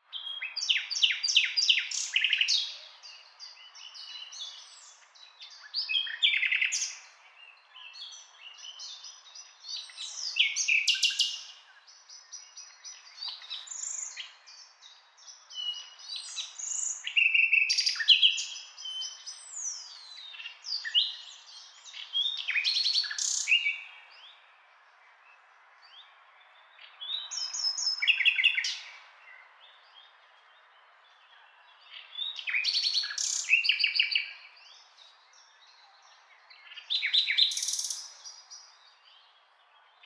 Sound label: nightingale song